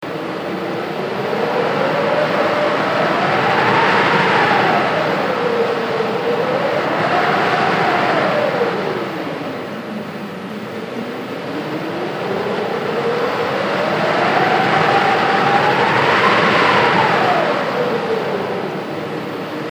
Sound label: Mountain Valley Windstorm; Wilderness Retreat